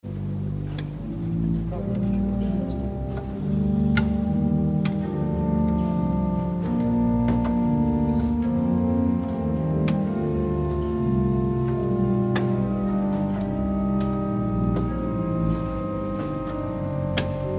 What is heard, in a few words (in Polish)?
organy